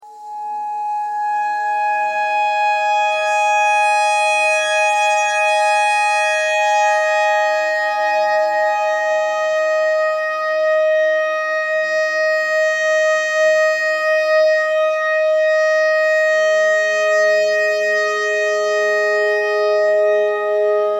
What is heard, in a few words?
clarinet notes e clarinet notes e thread simple